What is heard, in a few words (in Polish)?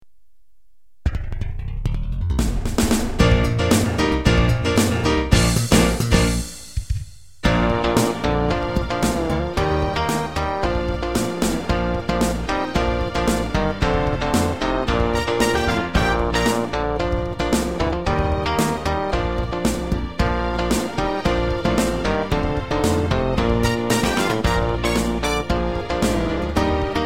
wersja instrumentalna